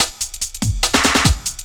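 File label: loop snare drum, 145 BPM, drum & bass; Ueberschall; Drum & Bass Flash Trackz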